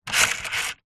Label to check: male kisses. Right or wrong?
Wrong